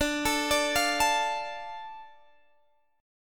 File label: D Minor D, guitar; Dm arpeggiated; x,17,19,19,18,17